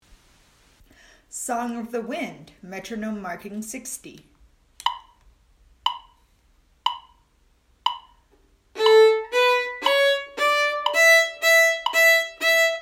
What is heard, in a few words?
Song Of The Wind Met 60